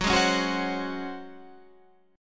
C sharp Major 7th Suspended 4th F sharp

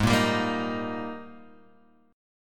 Ab Major 7th Suspended 2nd, down, Guitar, Open G

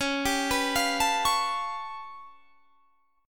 G 9th Flat 5th C sharp

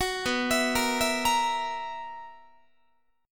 B Major 7th Suspended 4th B, Guitar; arpeggiated; 21,19,19,20,20,21

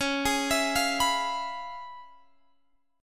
Db 7th Sharp 9th Db down Guitar Open G

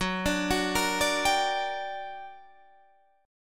G Minor Major 7th, Gb, guitar chord

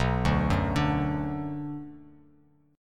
B Minor B Bass Standard; arpeggiated; JGuitar

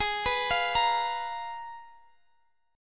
G sharp Minor 6th Add 9th, Guitar Half Step Down

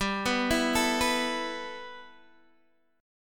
G 6th Add 9th G, Guitar DADGAD; x,10,9,9,12,9; down

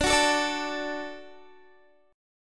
D Major D down Guitar; strummed; tuning 4E,4A,5D,5G,5C,6F

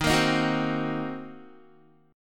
Eb Minor 6th, down, Guitar; strummed; 4E,4A,5D,5G,5C,6F

